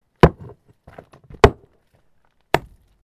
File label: Ball Peen hammer hitting wood surface